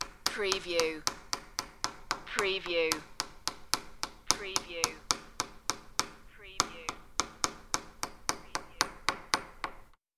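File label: Pen Tapping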